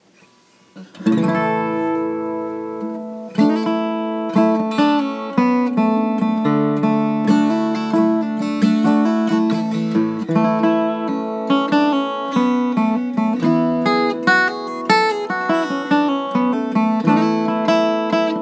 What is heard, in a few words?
klepper a capo; The first hour after tuning it up